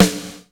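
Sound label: snare drum wood hall; SNAREDRUMS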